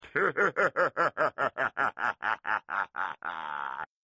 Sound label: cackle; spongebob; super-easy-fun-adventure; plankton